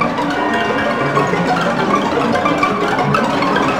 A#3, symphony; Northstar - The Wizard VOL-1

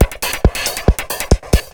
drum loops, Techno, 138; xtra-drumloops

